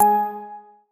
clock tick

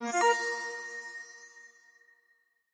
winter chip minor

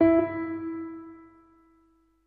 wood spinner

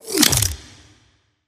space tile eliminate

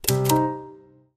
newsroom menu

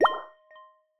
conveyor item centred

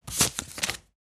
wood tile flip back

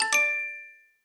block wheel selection

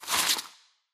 winter tile pickup

